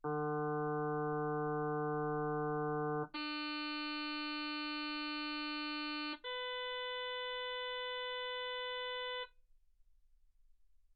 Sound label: harm sinus 48kHz